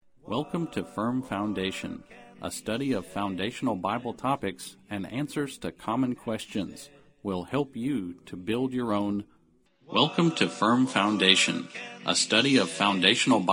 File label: Vocal Processor; Doofers; Thebellows